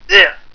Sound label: pain; wartso; barney